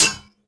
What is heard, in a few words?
hit metal; impacts explos; cortosis